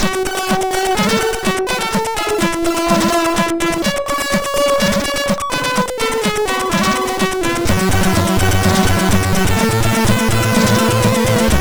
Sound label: Cool Intro, MOS6581R4